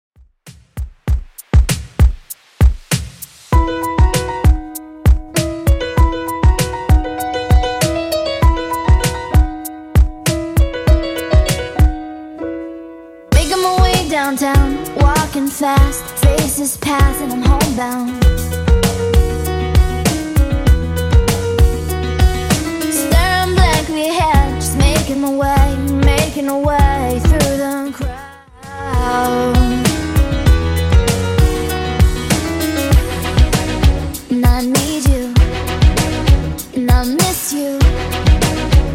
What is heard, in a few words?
2ks Alternative Redrum 98 bpm